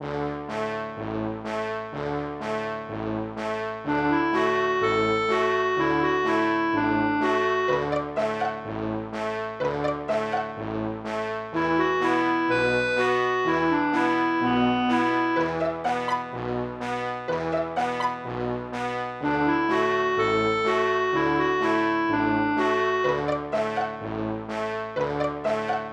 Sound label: clumsy girl